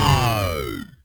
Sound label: Tonal FX F#